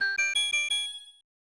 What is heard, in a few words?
Item Appears; BS Zelda; SNES